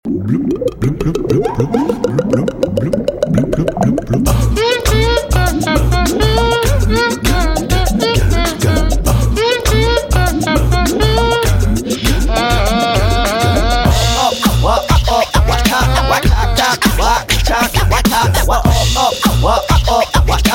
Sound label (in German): Beatbox